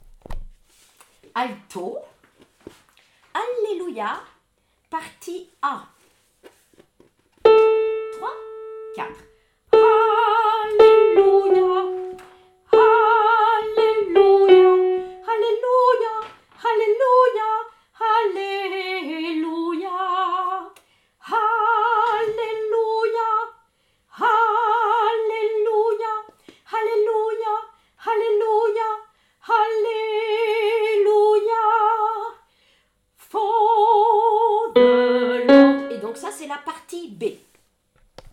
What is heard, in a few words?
Alto; Hallelujah Handel; Ensemble Vocal Chanteval